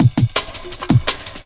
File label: 165 bpm classic break